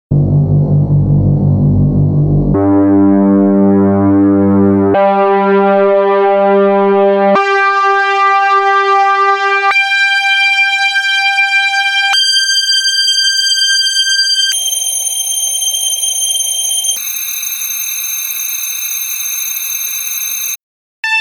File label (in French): exemple brass; physical music; time freezer